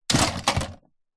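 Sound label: leisure toy drop into toy box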